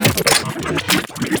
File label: Briefcase Launcher Reload; Warframe; Weapons; Corpus